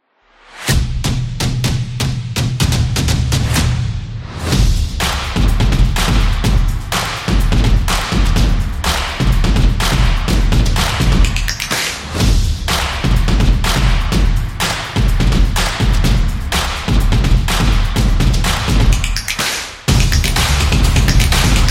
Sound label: Drum Opener